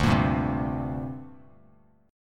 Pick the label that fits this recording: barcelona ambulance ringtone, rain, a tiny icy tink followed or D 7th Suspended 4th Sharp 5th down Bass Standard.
D 7th Suspended 4th Sharp 5th down Bass Standard